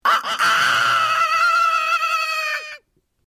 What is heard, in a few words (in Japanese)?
ニワトリの鳴き声